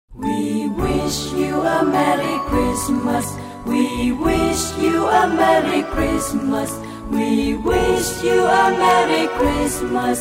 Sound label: jingle bells we wish you a merry christmas hoa tau